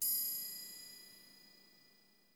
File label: TRI PP, Percussion; Miroslav Vitous - Percussion & Harp